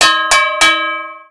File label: clang x3